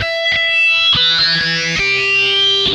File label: HARMONIX, Funk Guitar; 90 s Sample CDs; Zero G